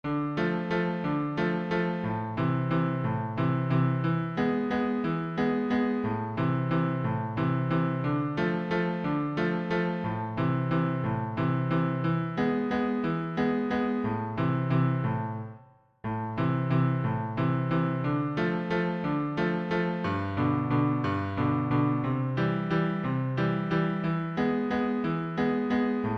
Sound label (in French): accomp